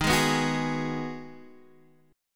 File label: Ab Minor 7th